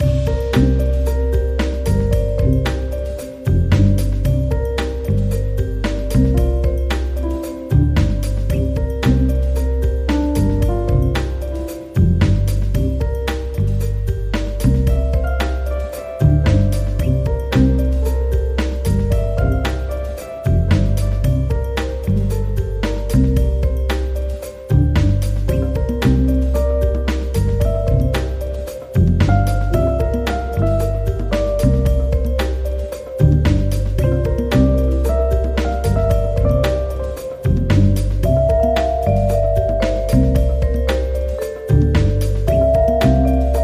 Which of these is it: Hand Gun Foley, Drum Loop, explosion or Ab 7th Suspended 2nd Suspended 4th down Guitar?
Drum Loop